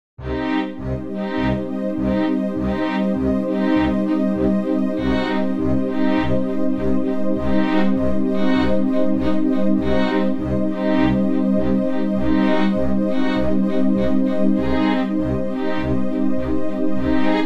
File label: Suspense Thoughtful Grooving Strings; theatre films music pack vol 01